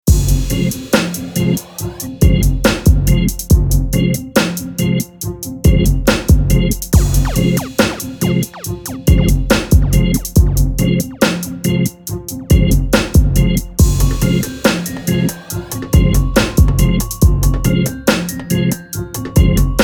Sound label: Instrumental